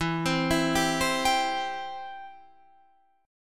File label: E Minor Sharp 5th E down Guitar Standard; Em#5 arpeggiated; JGuitar